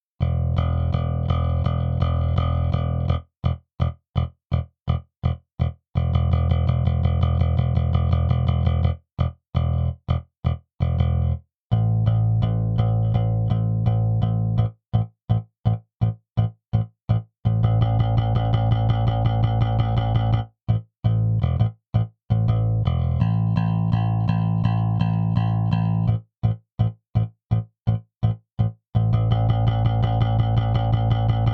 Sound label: Bass Defoult setting pick